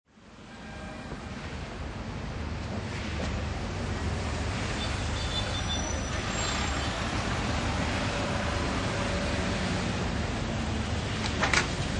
Town Traffic Junction, Light to medium traffic at Spanish town; Town Traffic Junction: Light to medium traffic at Spanish town junction. Cars, mopeds, motorcycles and occasional buses g